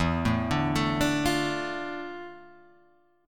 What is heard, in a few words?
D Minor E, chord; Dm/E arpeggiated; JGuitar